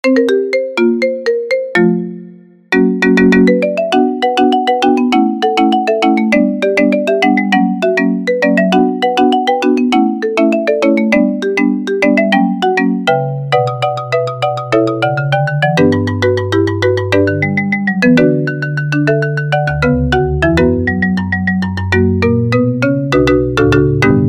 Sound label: Marimba; Death Note